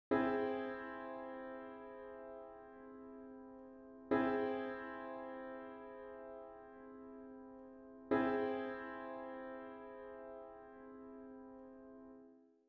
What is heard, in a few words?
Augmented Chord